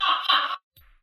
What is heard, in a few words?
Baboon Tone